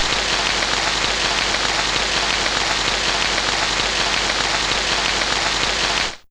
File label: Rain, Fairlight CMI IIe